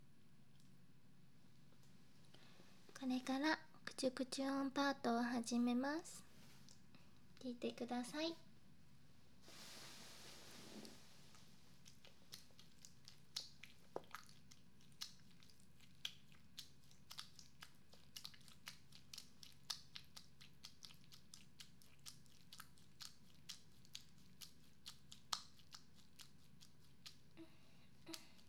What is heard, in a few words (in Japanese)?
アソコのクチュクチュ音を生録音